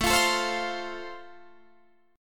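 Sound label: A Minor Sharp 5th, Guitar Standard, chord strum; Am#5 strummed; 17,20,19,17,18,20; JGuitar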